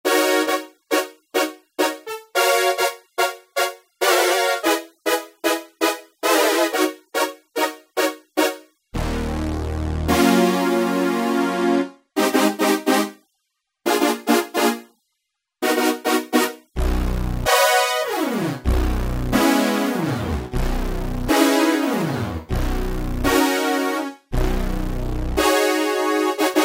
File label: Total Horn; Generalmusic S2R